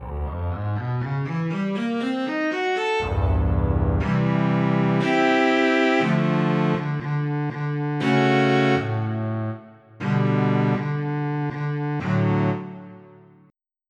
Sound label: Viola; FF7